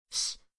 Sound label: shush